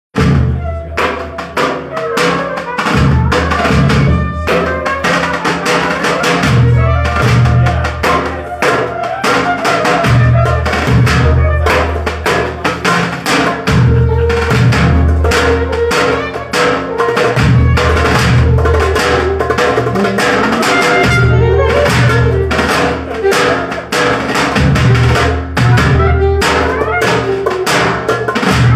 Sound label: Aybalam rhythm, Rehearsal Recordings